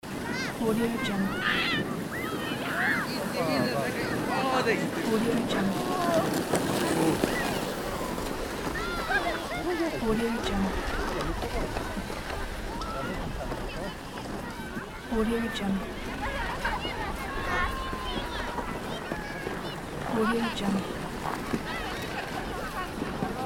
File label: skiing holiday ambience